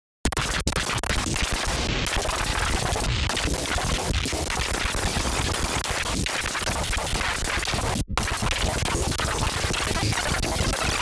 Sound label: glitchy haha